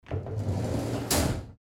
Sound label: door sliding close